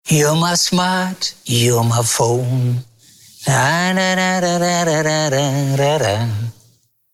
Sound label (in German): Klingelton Trocken